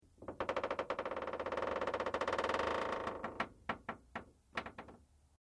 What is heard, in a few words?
METAL CREAK LIGHT; Materials